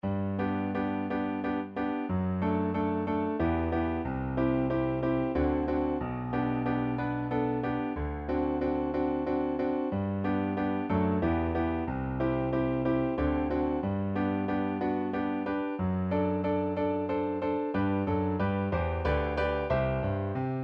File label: piano; 冬景色